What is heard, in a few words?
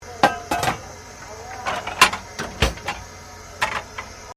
Steamtrain Furnace Close; Bellarine Tourist railway vehicles; Trains